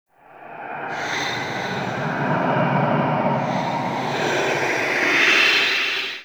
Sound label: Eerie Texture; Ambience; One Offs; Red Night